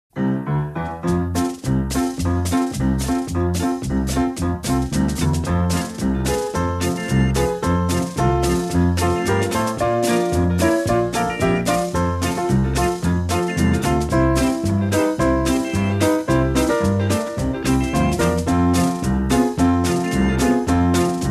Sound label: cocktail piano